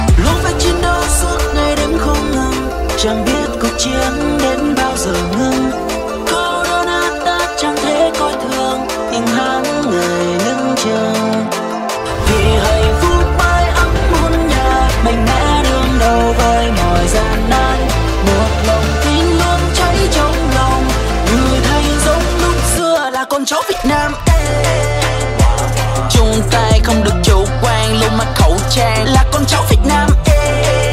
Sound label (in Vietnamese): Nhac Chuong; nhac tre